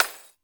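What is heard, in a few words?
China Breaker, Drum Machines Alesis D4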